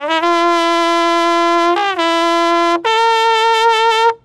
Bugle

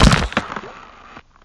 Twist

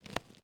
Clothing

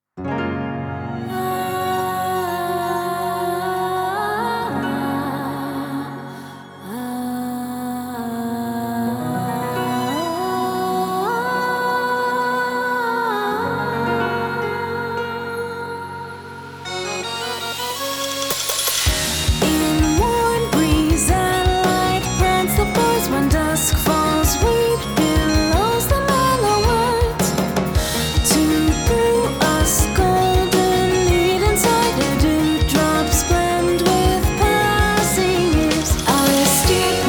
Ensemble Hymns 齐响欢歌; Wuthering Waves; Stars Intertwined Music Collection Vol.2

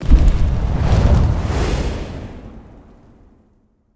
Fire Start

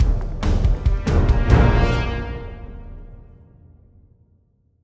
Combat Trans 140 Gm; Combat Basalt4 Trans1to2 140 Gm 58 Master

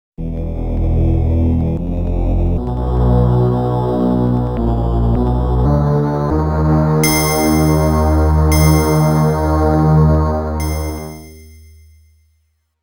Bad End; TAITO SOUND BULLETS (2025)

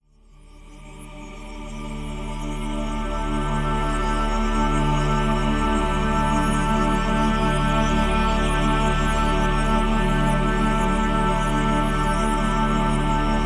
Peace Jingle; darkenstein 3d

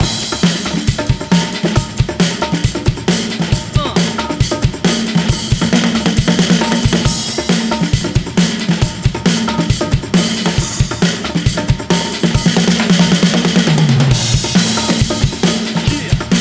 drum; Downhill Domination; PS2

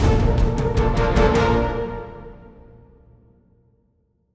Combat Area B2 Trans1to2 155 Am

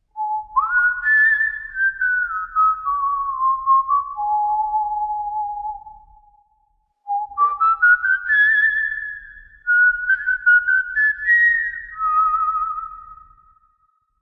SWING; SQ Music Collections; Xenogears; Windy Song

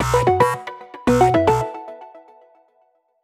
Phone Notification; neon inferno

